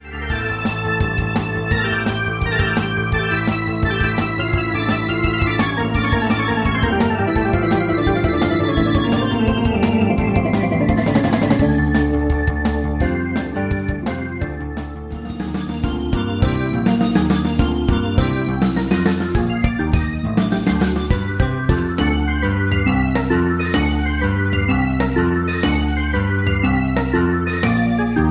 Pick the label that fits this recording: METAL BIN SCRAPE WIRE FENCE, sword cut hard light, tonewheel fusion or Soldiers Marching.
tonewheel fusion